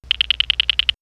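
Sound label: Clicks, electronic fx; X-Static Goldmine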